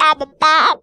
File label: Funky Vocals; USB Soundscan vol.18; AKAI